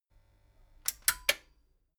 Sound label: metal button stereo click power off slow